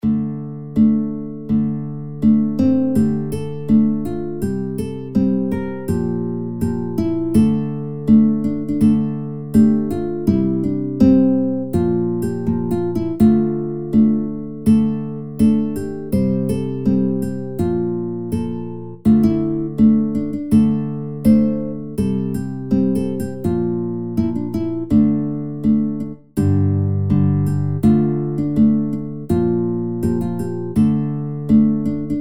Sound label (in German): Freie Krieger (instrumental)